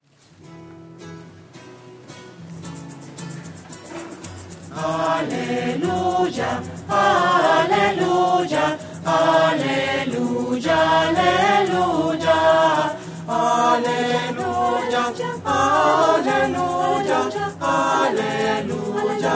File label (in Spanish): aleluya voces; misas 2012